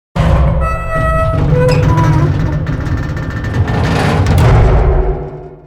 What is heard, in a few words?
Boom